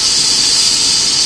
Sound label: hiss, headcrab canister; Source Engine; video game; npc